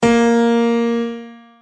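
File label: A#3 piano; notes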